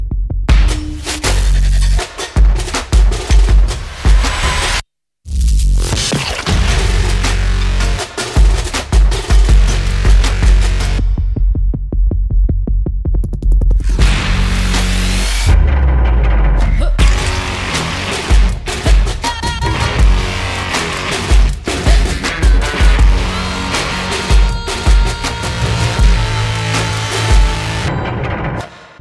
Super Sound Bass

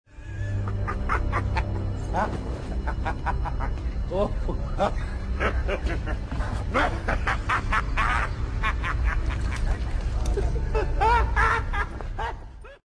laughs; the dark knight joker

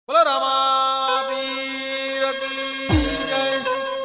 sms tone; children

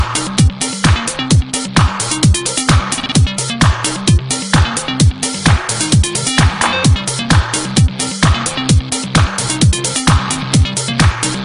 electronic tune, ringtones

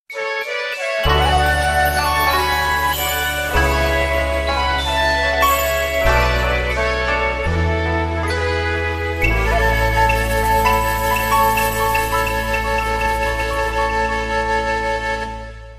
japan flute; ringtones

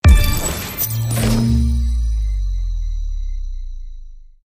Loot

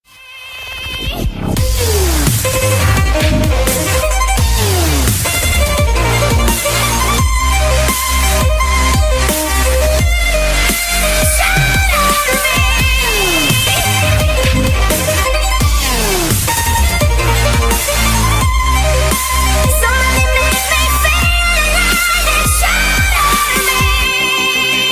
Violin Dubstep

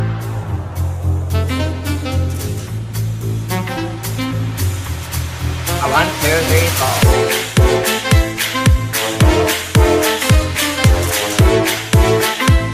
Cyber Jazz

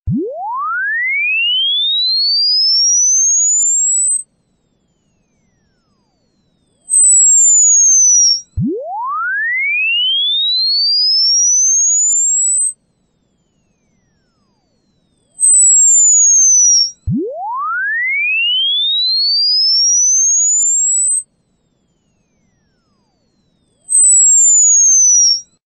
High Frequency Siren

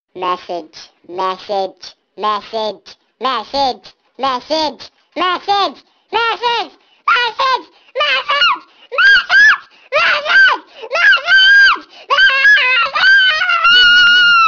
Screaming Message

